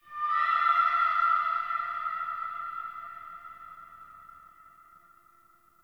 Spirit Chatter Three; Ambience; Warframe; Sentient Lair

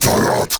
Cast Vocal; Warframe; Uriel; Remedium

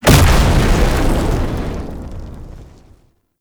Cast Impact; Warframe; Uriel; Infernalis

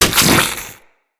Venom Tick; Warframes; Saryn

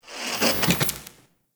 TV Turn On; Warframe; NineteenNinetyNine

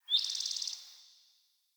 Bird Summon Chirp; Pothia Bird Guide

